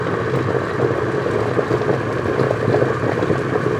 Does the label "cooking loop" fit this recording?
Yes